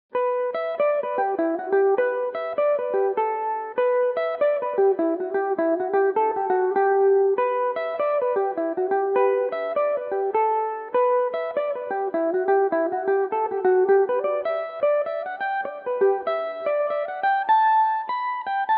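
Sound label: G major belmuse, irish mandolin; Aurora O'Neill; original tunes written and played by aidan crossey; Belmuse EM-190 electric mandolin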